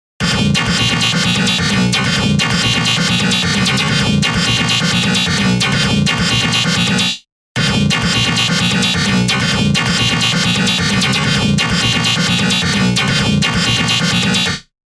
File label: Dry